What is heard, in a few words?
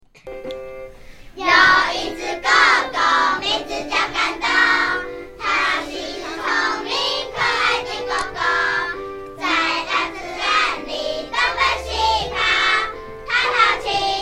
Singing Moving dog by students